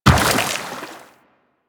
Run Water, Footsteps; DE; Warframe; Tenno

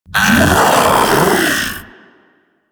Infested Splitter Joined Vocal Attack Medium

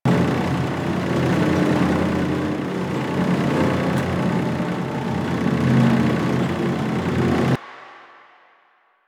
Cave Spinning Metal Tunnel Bed Loop; Ambience; Warframe; Stalker Cave

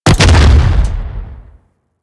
Step Metal; Warframe; Teralyst; Ropalolyst; Steps

